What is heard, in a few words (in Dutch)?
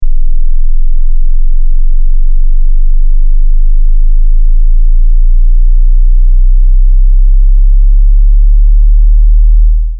20 - 40hz exponetieel; testsignalen